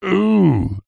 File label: human male adult ssay eew as if disgusting; zapsplat